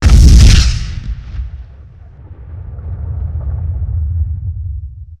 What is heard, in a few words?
Explosion aftersound